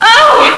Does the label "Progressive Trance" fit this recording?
No